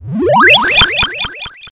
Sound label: Upgrade complete; ld31 space diggers